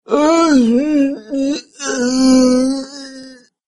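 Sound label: sounds of voice of caveman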